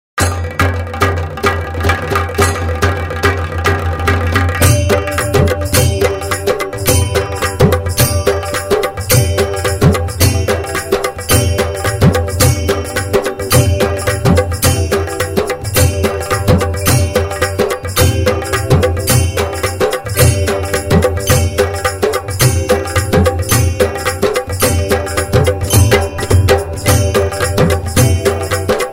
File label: Rhythm; Ganesh